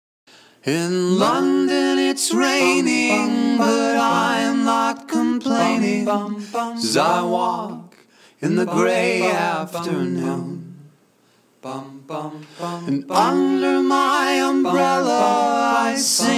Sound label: Lullaby; Shepherds Bush